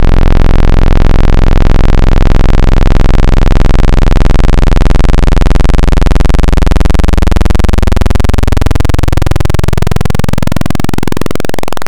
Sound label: Analog PWM Square, wavetable